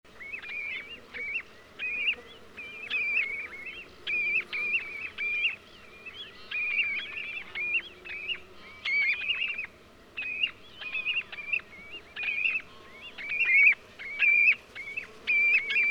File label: crplov, csnipe gallinago; karrend wiesen